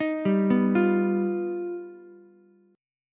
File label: G Augmented 7th, Ukulele; G+7 arpeggiated; JGuitar